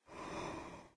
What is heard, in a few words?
idle breath in; smite; god odin